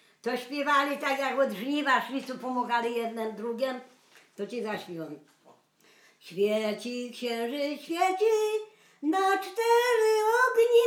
świeci księżyc świeci przyśpiewki

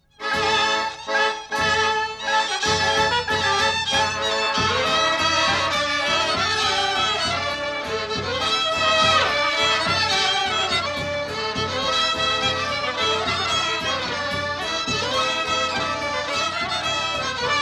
kapela; wędrowiec

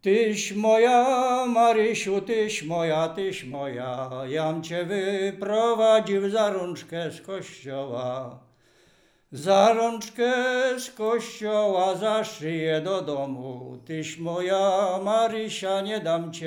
Oczepiny

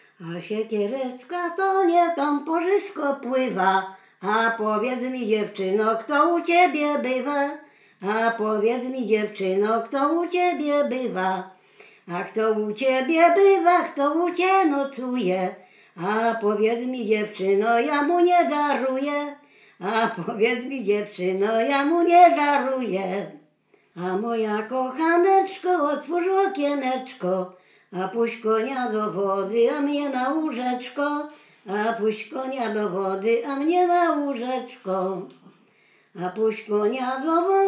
przyśpiewki wujka

